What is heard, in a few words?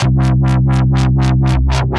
Wobbler Bass; The Forge by Hecq; Ableton; Factory Packs